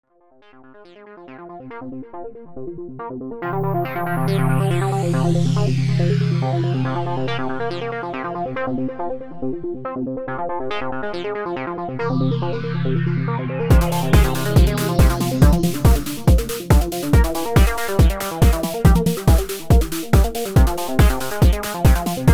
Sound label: Ambient Hope